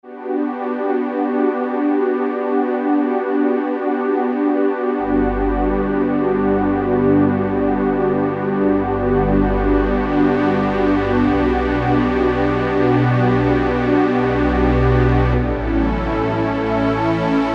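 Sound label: Filter Strings, synth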